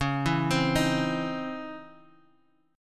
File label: C sharp Minor Major 9th C sharp, chord; arpeggiated; 21,19,22,20